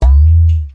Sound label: wavedrum